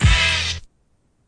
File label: orch hit